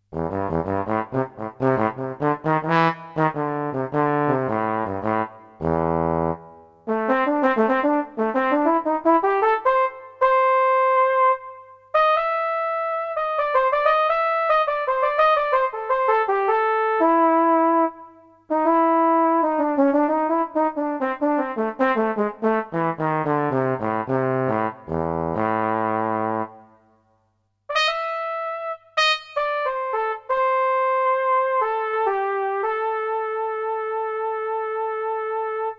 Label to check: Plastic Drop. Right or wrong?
Wrong